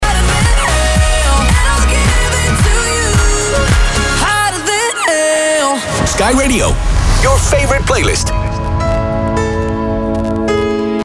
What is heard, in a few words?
holland id, tropo